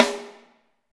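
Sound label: snare drum; Best Service Gigapack 2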